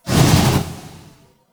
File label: whirl release; hollow knight